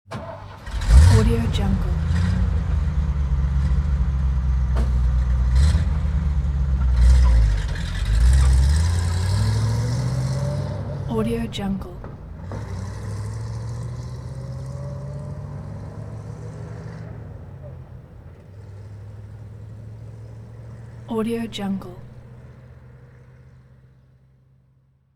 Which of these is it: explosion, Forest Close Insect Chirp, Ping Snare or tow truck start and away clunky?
tow truck start and away clunky